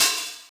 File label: open hihats, techno; Techno ID